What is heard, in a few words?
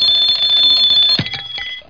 clock